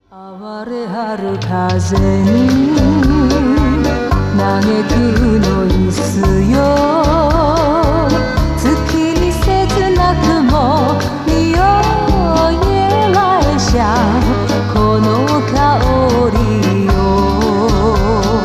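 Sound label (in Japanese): showa kayou female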